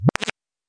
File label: head pickup